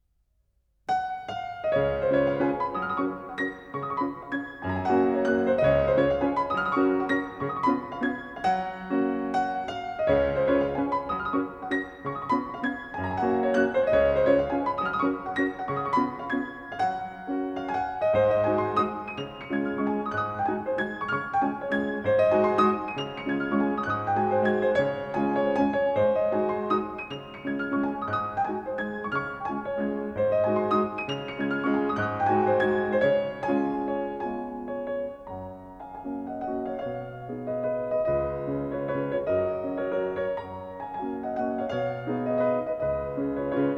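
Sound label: Waltz in G flat, piano; Waltz #11; Op. 70 No. 1; CHOPIN; Nikita Magaloff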